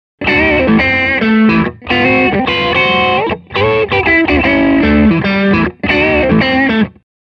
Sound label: Hollow T bridge & neck, pickups; Hollow T TV3 & TV1; Neck and bridge together; Stoppin